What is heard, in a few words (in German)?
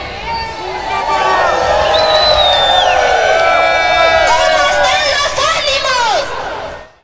revolution parole; best service Blue Box